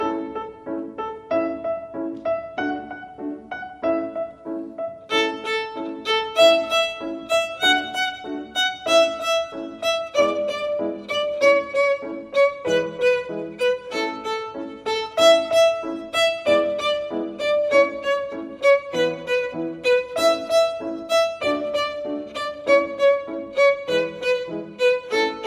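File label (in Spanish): Twinkle Ritmo B Normal, violin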